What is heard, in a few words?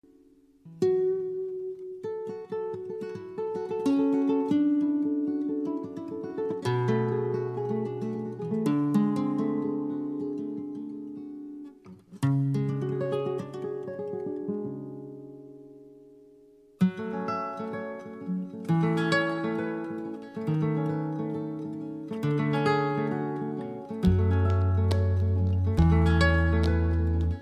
Flamenco Fusion